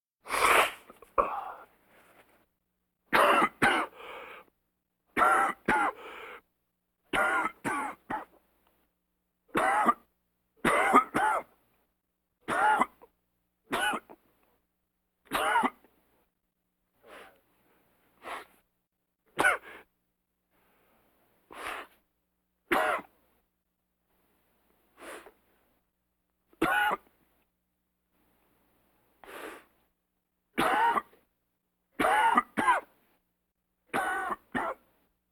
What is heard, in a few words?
Vocal effects breathing Moaning Baby